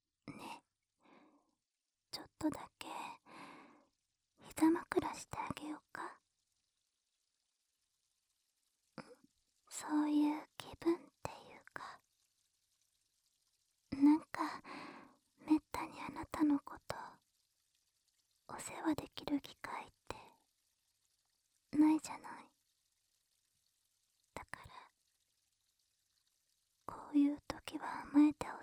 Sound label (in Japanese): 雨音と耳かき